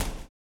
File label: STOMPS; Spectrasonics; Vocal Planet; Blues Soul